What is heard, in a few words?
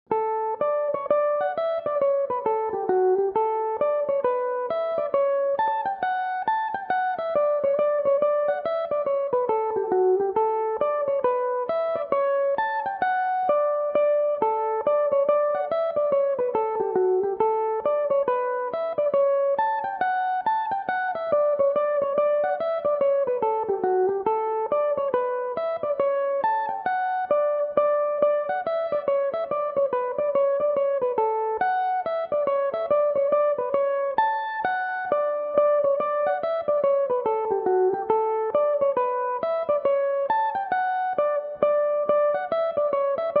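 hornpipe, D major, mandolin; Gan Ainm 19; Belmuse EM-190 electric mandolin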